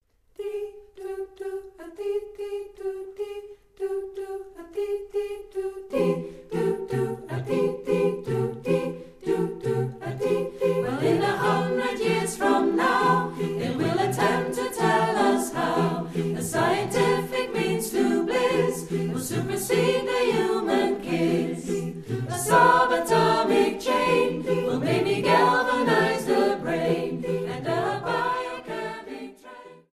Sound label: ssaatb